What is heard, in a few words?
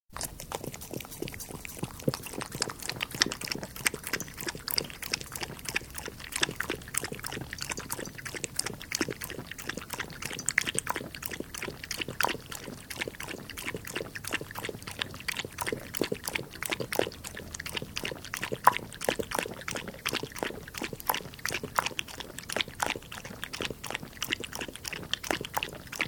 Dog, interior, lapping water (Close perspective recording); Dogs; BBC